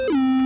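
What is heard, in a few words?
Square Switch Down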